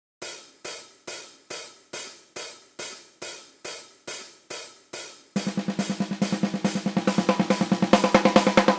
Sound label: Intro, bass